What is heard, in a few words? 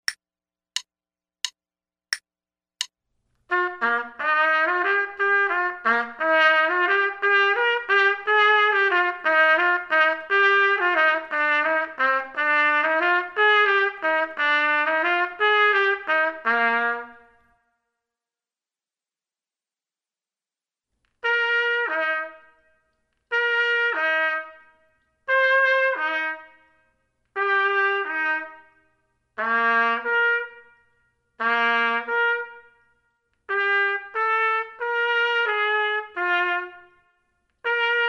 trumpet; Selected Duets for Trumpet